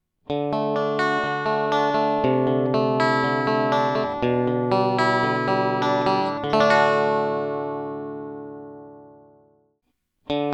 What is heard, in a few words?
Guitar Phrase Chorus